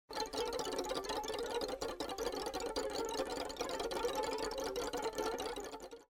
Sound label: Needle Swarm